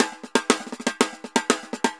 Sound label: TAMBOR, DRUMS SAMBA; Best Service ProSamples vol.14; AKAI